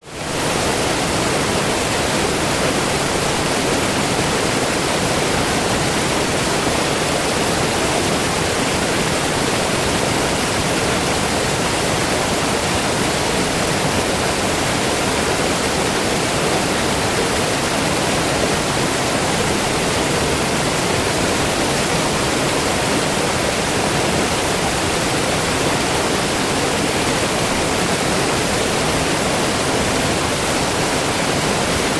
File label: nature waterfall small heavy flow